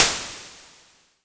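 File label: White Pulse; Roland SPD-S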